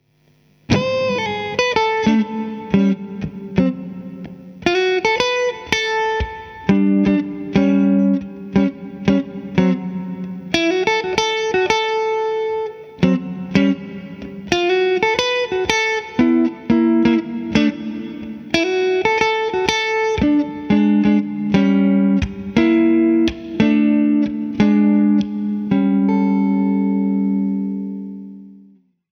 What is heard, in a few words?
guide tones